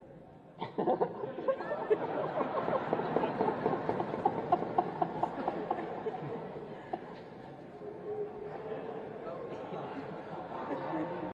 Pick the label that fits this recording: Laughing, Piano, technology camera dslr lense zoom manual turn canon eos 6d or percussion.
Laughing